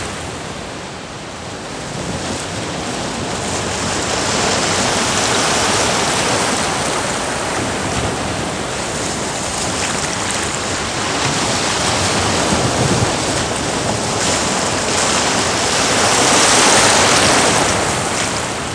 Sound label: WAVE, ELEMENTS